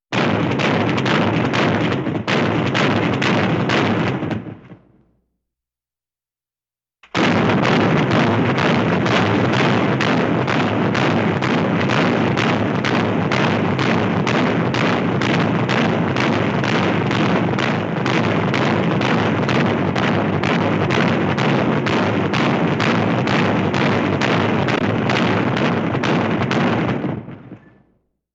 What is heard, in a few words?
Semi-Automatic Heavy Gunshots